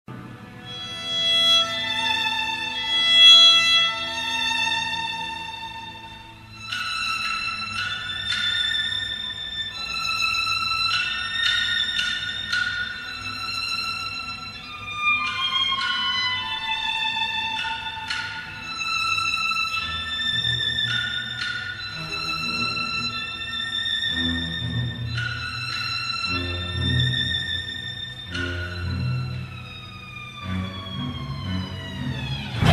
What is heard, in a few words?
violin solo; 5 stücke angst 2